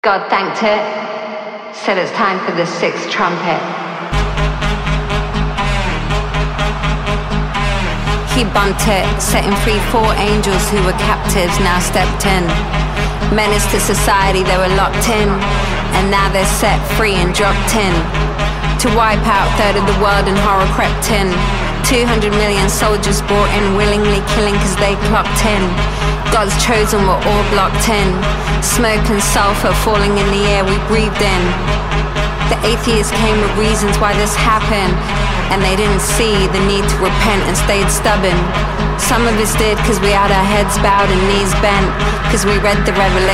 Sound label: TRUMPET